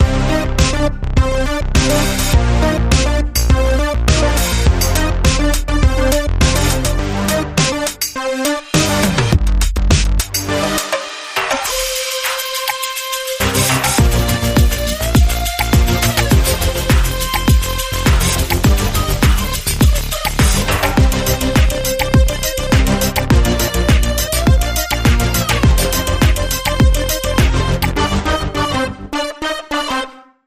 Seven Synths

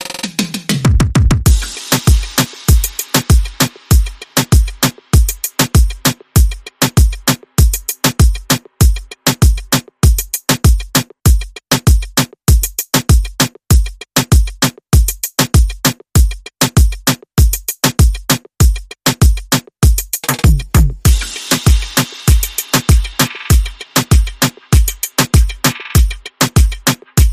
Latin in Me Beat

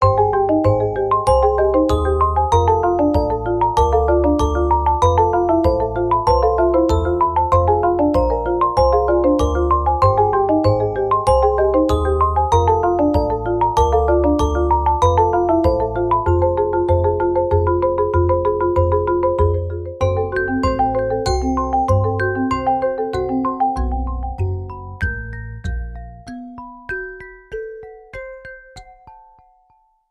Rippling Marimba

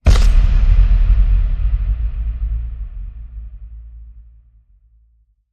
Bag of Bones Breaking Boom

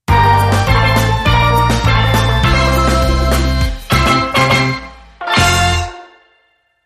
Beach Ball Bounce Sting

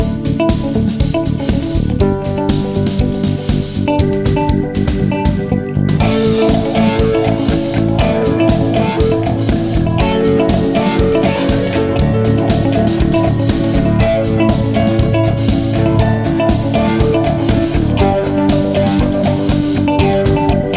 Soaring Indie Pop Rock

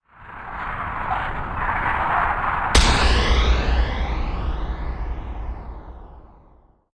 Rumble And Big Impact Sweep

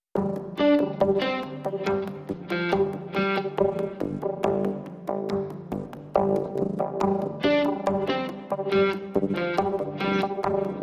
Insightful Reflections (Positive)

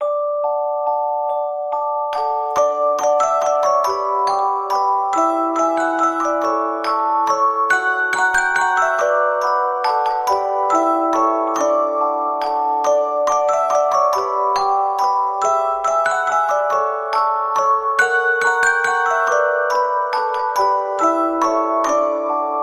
We Wish You A Merry Christmas Music Box

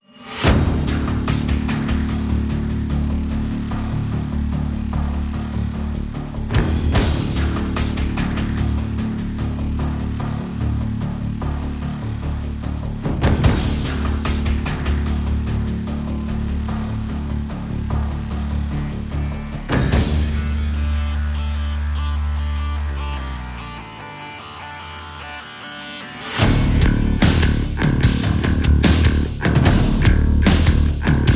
No Guitars

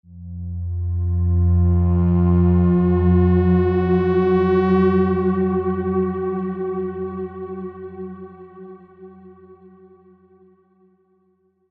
Clouds Above Clear Synth Bend

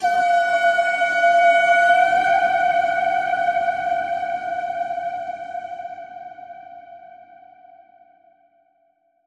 Warning Call

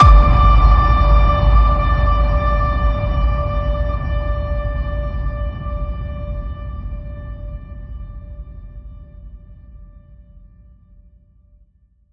Ping Of Doom, D

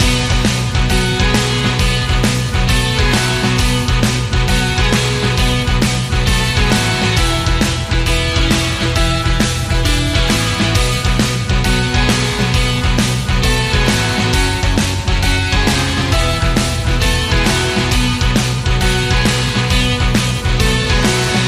Rock n Roll Christmas Loop Rock n Roll

